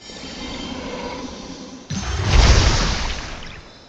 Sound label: rott clean shrine; kena bridge of spirits